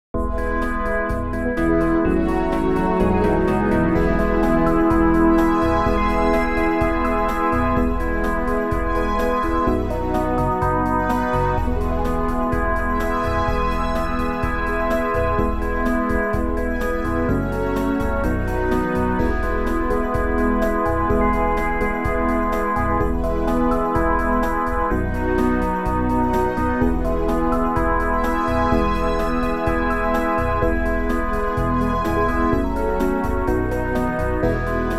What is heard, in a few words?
backing; New Hope Music